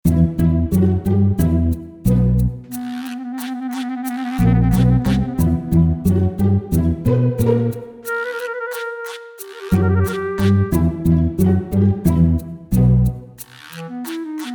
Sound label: Sneaky Klezmer Comical Clarinet